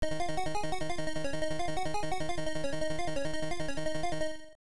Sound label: Game Start; Tutankham; arcade